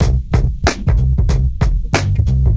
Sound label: test beat